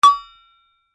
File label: COWBELL HIGH, simple twin cowbell; Bells; Musical